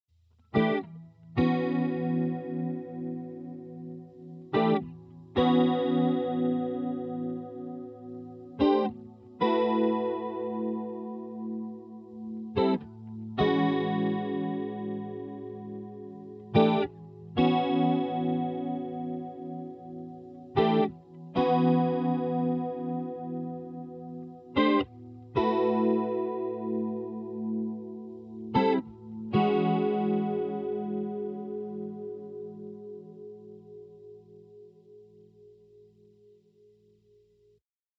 jazz riff E 120

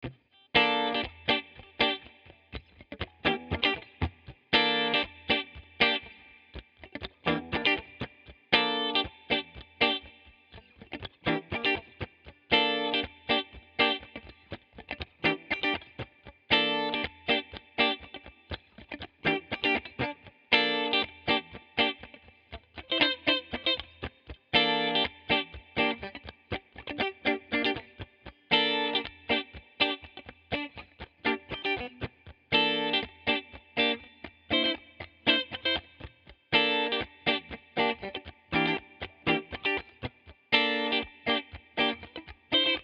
Tight Funky Foot; admiralbob77

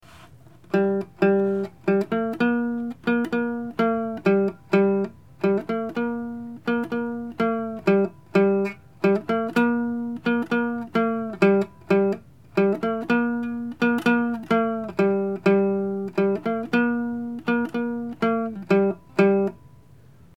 Uke

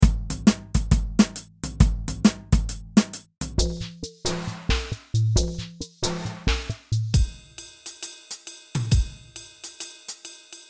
Jazzy Beats and Percussion 135

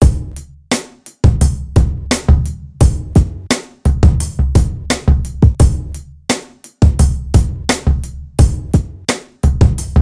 trip hop beat

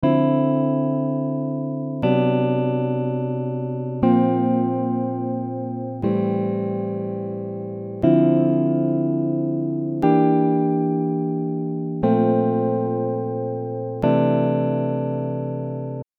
Dm 120 GTR Jazz Chord Sequence

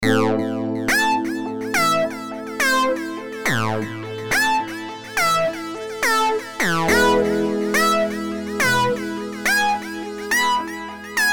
Variation on Am E F Synth

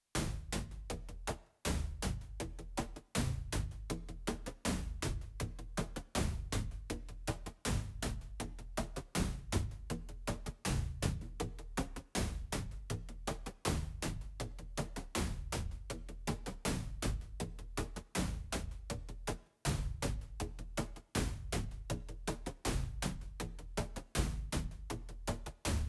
funk HiHat

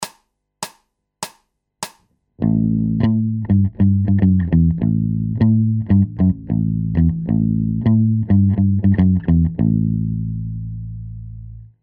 Bass Loops

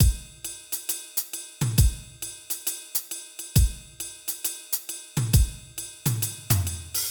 jazzy beats and percussion 135 loop

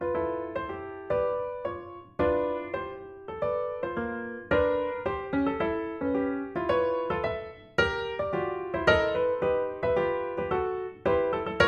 piano Studio Jazz 110bpm

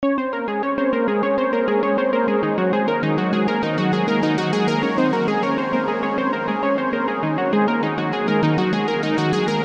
Synth; Vidian

